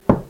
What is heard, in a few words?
ball side; billar